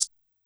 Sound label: A#4 Analog Maracas, Dance Kit; Yamaha PSR-E323; drum machine